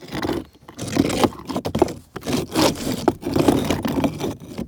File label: Brick scratch; افکت صوتی